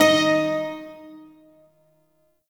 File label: Shawzin Oct Two D Sharp; Warframe; Duviri Shawzin